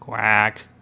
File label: Quack (human); battlerise